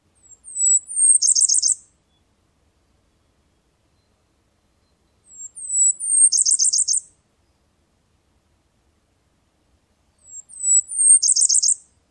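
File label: songs, Blackburnian Warbler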